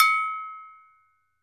TOKE BEL, Drums&Percussion, Toke Bell; E-MU Producer Series Vol. 7 – Old World Instruments

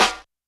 Snare Break, YAMAHA RM1X

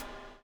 BUBL, Backbeat; Spectrasonics - Backbeat

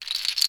HUSK SHA, CAMEROON; AKAI S6000 CD-ROM - WorldSounds; CAMEROON PRC

